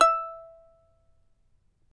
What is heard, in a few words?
charango E4, guitars; Spectrasonics; Hans Zimmer Guitars VOL-2